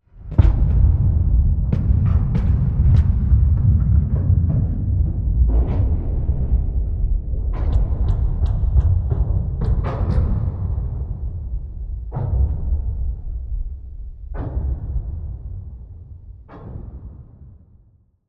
metal, heavy creaks, shipwreck, submarine deformation under pressure; Alexander Kopeikin; Black Metal